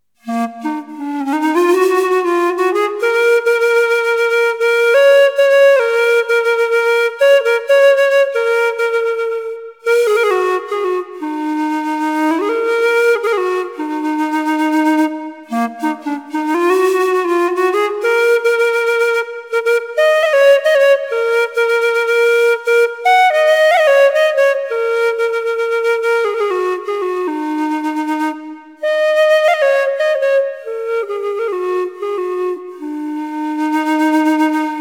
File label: Quena